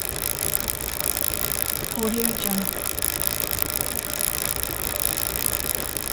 chain winding loop

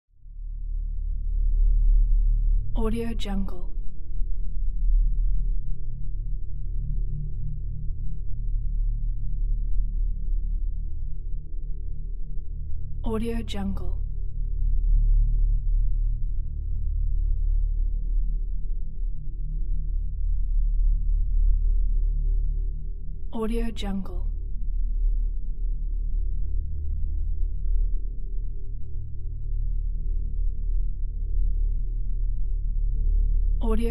quiet vibration

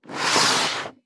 skateboard floor scrape; soundbits-soundfx

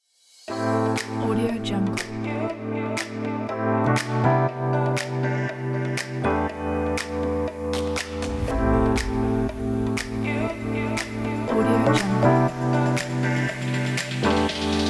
fashion lounge house

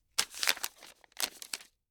paper movement newsprint handling grabbing

